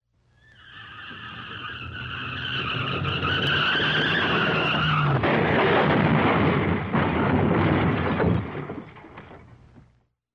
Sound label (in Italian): Auto, Frenata, Incidente